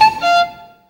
VIOLIN BIRDS; Best Service; World Colours

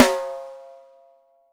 6X12 WOOD; Sampleheads; Peter Erskine Living Drums

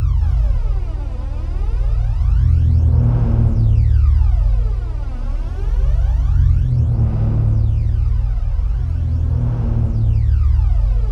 C1, Synth Leads

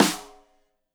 Rim Shot Stereo; Deeply Sampled Drums; SMDrum

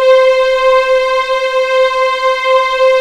C5 LEG F, VIOLINS; East West; The Ultimate String Collection

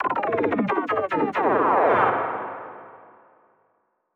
G Downlifter Rate thing

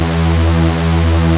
BIG SAW E1, Analog; E-MU Formula 4000 Series Vol. 3; Analog Odyssey; Synth Builder

